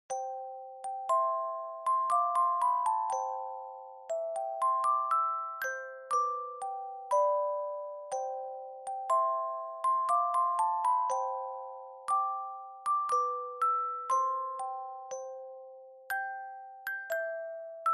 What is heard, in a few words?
Dry Fast